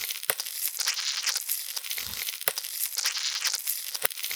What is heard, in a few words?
Gore Loop; don t be afraid the first toy